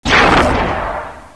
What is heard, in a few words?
force lightning